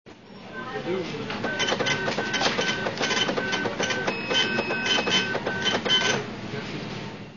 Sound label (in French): texture caisse bus, son-image